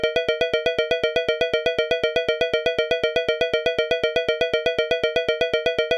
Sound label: suspense tune, wheel slot machine; gmod; pcasino